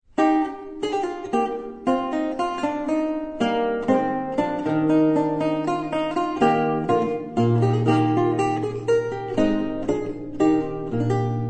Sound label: Bach Menuet, lute